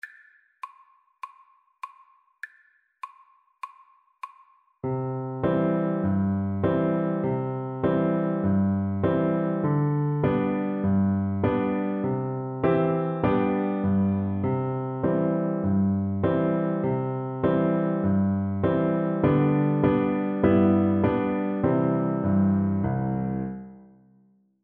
Oboe

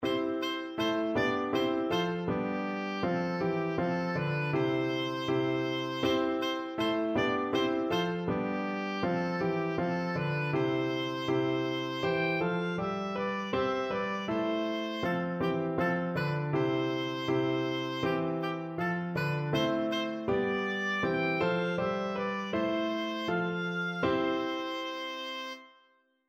good king, oboe

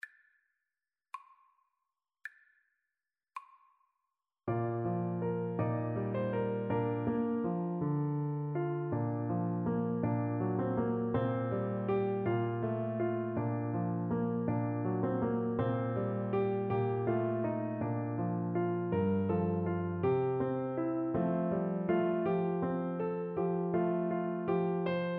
christmas song, Trombone

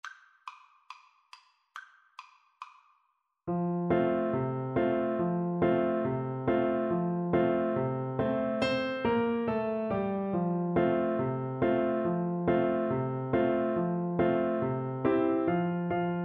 alabama, french horn

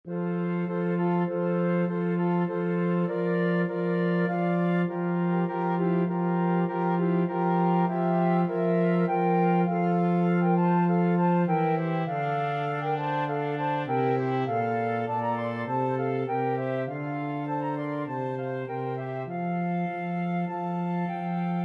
flexible mixed ensemble, 4 players

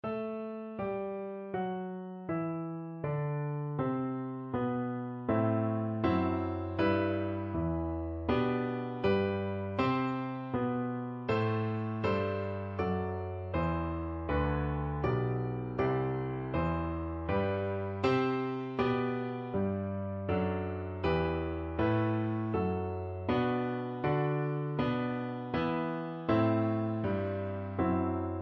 personet hodie violin

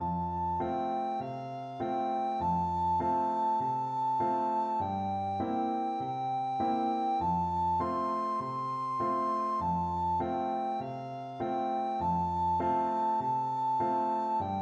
recorder

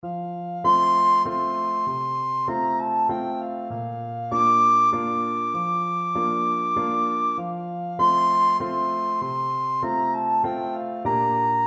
recorder

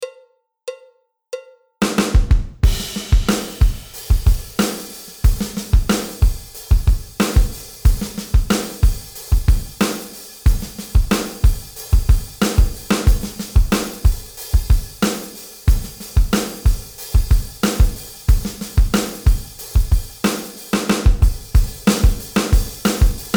funk rock, drums

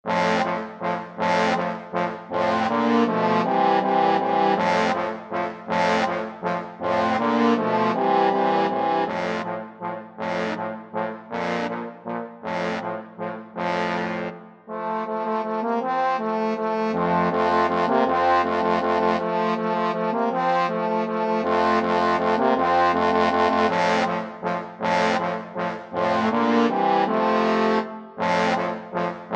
vivaldi autumn, trombone quartet

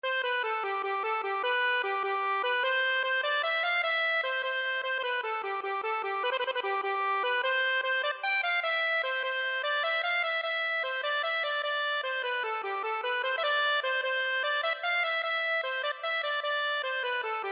denis, accordion

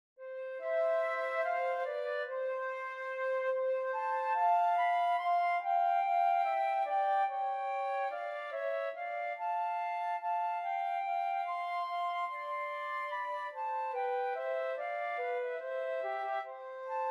rest in the lord, flute duet

